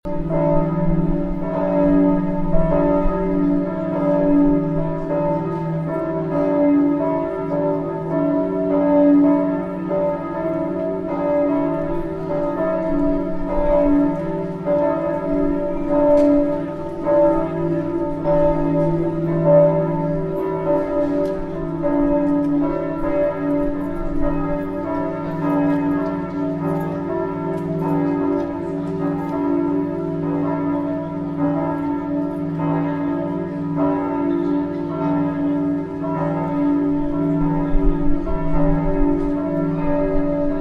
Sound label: church bells